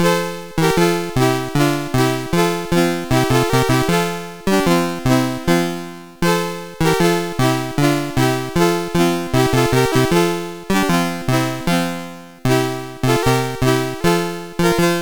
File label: Deck the Halls CSG8580R5; Master Composer